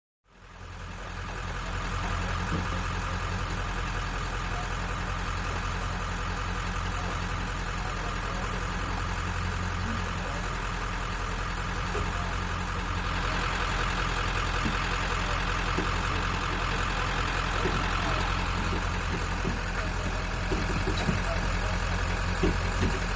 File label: Drag Boat Loading